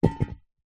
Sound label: tin lid metal thin small drop carpet; foley